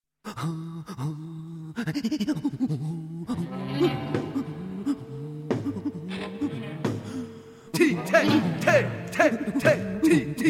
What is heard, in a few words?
canto